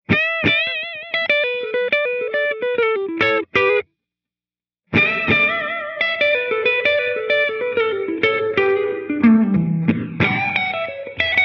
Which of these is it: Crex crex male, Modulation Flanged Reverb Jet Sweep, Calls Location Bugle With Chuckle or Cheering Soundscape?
Modulation Flanged Reverb Jet Sweep